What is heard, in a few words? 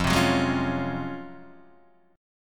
Db Major 11th F